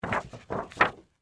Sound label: Sand Paper; Sander; Sanders; Blastwave